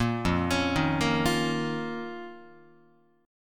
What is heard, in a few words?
F 7th Suspended 4th Sharp 5th F down Guitar